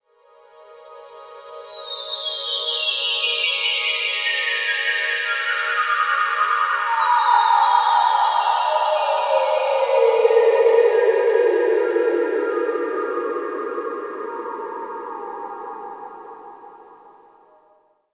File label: FALLING SYNTH WAVE, SLOW SYNTHESIZER WAVES